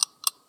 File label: Timer; Pac-Man Championship Edition 2 Plus